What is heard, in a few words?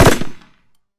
Gunshot